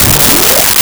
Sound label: Arcade Alarm; Video Game Sounds; 1001 Sound Effects